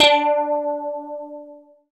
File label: GUI CLEAN, E-Guitar